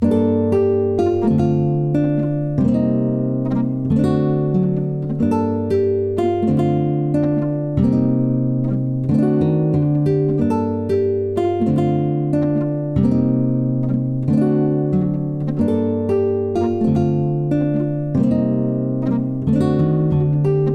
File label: 185 BPM G Min Low Guitar; Loop Stems; Cymatics; Miami Guitar Pack - Pt 2; Lo Siento